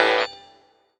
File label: TING; Rawcutz; Nu Forms